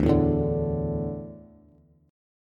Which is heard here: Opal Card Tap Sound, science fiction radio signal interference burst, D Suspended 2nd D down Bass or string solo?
D Suspended 2nd D down Bass